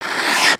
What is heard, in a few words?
ice skate